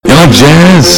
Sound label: jazz; torchlight